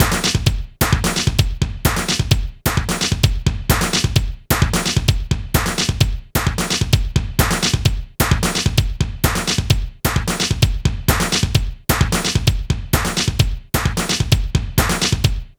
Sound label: TECHNO, Funky Xtreams; INIS